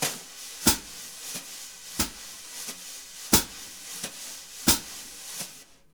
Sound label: BRUSH, Jazzistic; Univers Sons